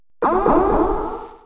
shield; PC Gamer IT CD 48; Big bang

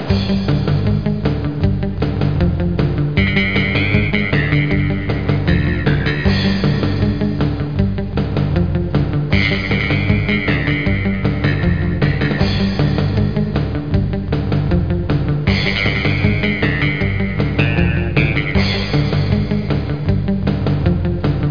guitar; NISSAN

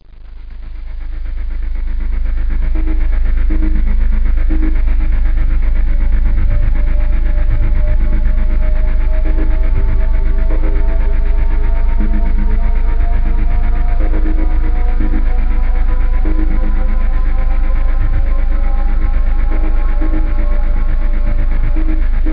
RISE, ambient

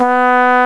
Trumpet B4; Indiana Jones Demo; iMuse

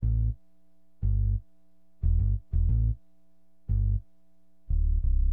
BASS, HipHop; LiveAct Pool 1

